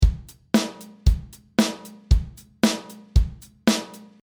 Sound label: Live Pop 115